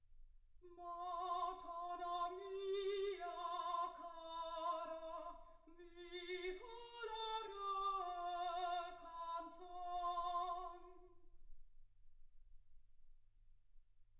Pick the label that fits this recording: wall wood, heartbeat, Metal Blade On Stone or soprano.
soprano